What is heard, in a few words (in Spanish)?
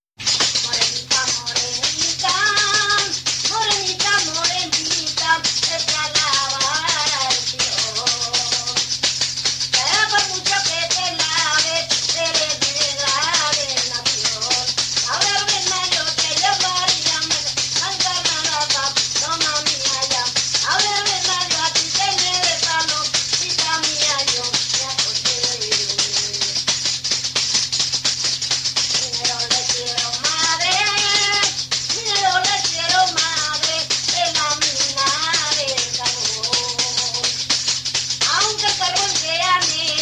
Jota, Castronuño; Jota "A la verbena"